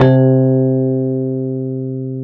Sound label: C2 DX7 piano